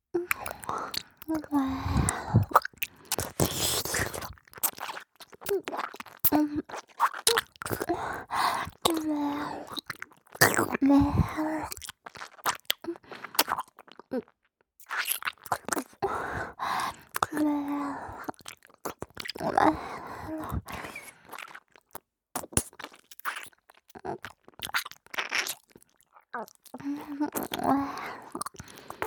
ねぇろっ…ぬちぃ…, 右耳

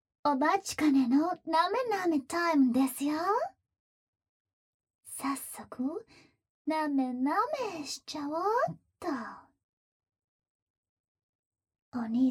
なめなめマッサージ開始, ASMR

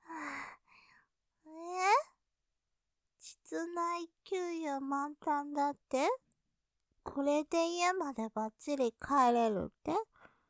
余韻; 膣内給油満タンだって?; ループで楽しむ！シェフの気まぐれ抜き抜きコース; モノラル

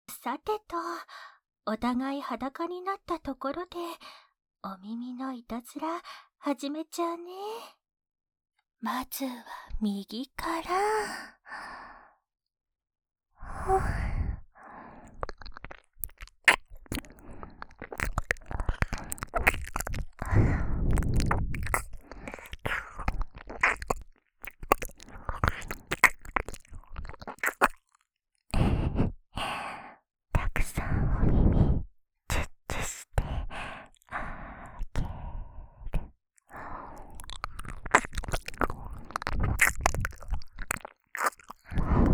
耳舐め part; Live2D×KU100バイノーラル; ドキドキはぁはぁ幼馴染お姉ちゃんの怪しいジュース

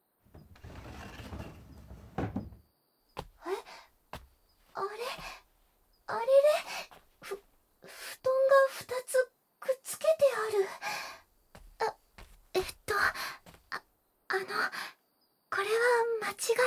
お布団で優しくマッサージ〜お背中&肩もみ〜

